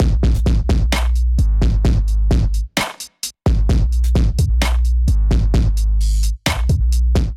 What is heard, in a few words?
Kit groove 130 bpm, Drums; MIDI Clips; Buttermilk; Ableton; Chop and Swing